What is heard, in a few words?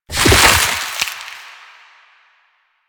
Proc Cold Max, Sounds; Mods